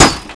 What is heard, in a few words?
Light Armor Hit; The Elder Scrolls III - Morrowind